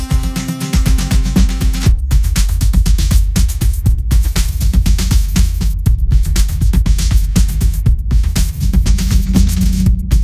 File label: upbeat pop melody, ringtones